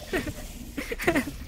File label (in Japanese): 笑い; PORTAL2